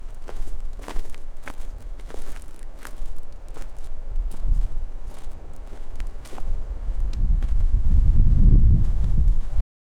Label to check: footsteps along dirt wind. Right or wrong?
Right